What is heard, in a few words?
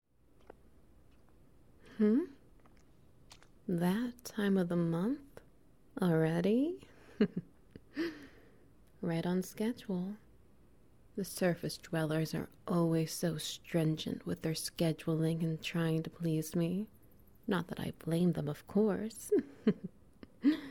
Ambience SFX Oral Only Wet Noises